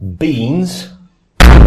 beans crash

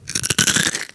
crunch

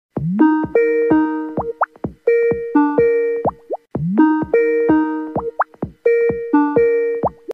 call sound effect; skype

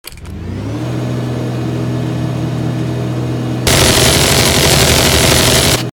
minigun

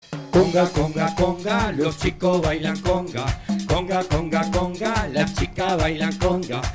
conga conga conga; fabian show

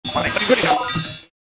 vanish sound effect; aku aku

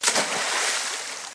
splash

